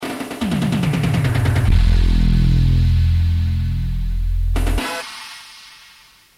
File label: blank sting; The First Blank Sting